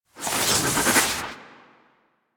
Melee Heavy Attack Small Charge, Weapons Melee Whooshes; Warframe